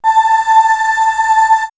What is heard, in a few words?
Choir; X-Static Goldmine; backtothe70's